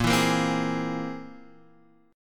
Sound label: C Augmented 7th A sharp